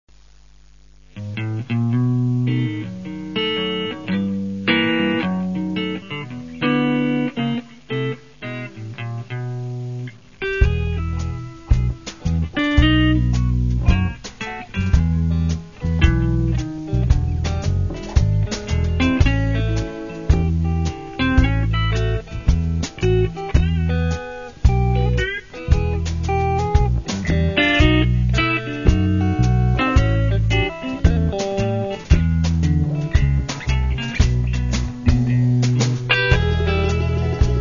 save the blues

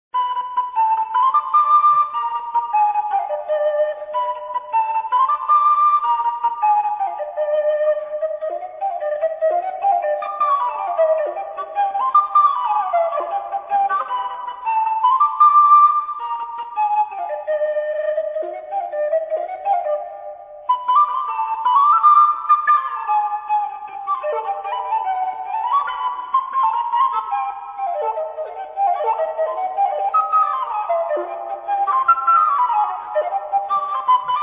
sopilka

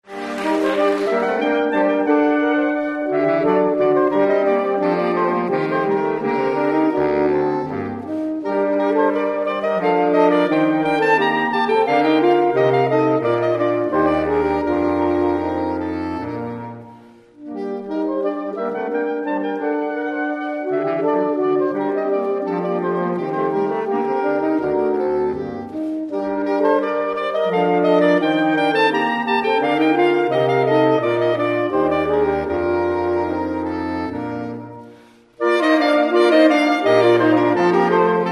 sax classics jazz

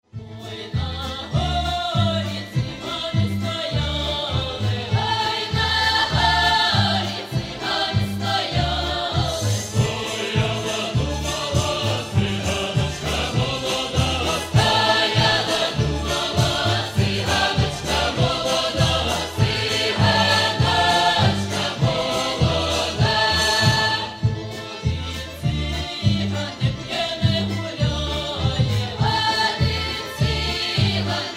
Folk Choir; Volyn

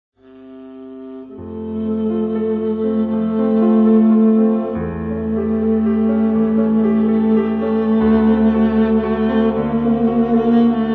music relaxation

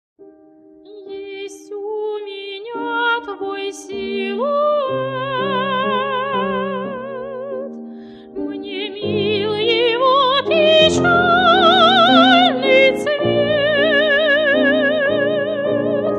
vocal miniatures

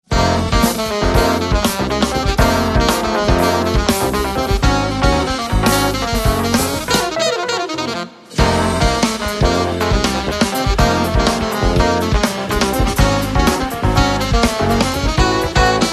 piano live

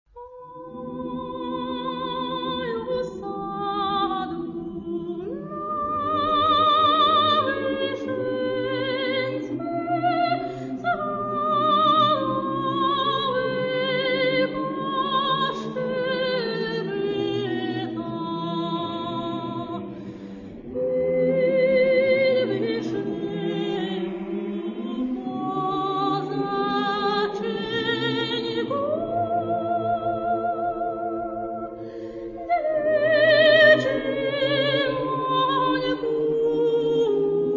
choir masterpieces

